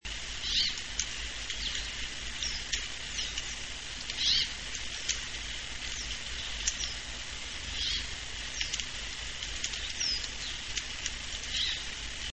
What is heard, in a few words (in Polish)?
nawoływanie czyżyka